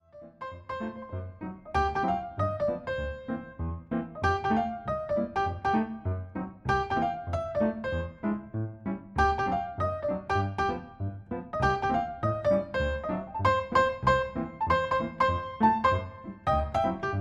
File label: Trio